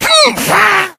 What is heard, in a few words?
been shot, infected; npc; Left 4 Dead 2; Source Engine